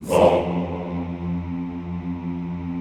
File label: Classical Choir Hmmm F1; Best Service; Extended Classical Choir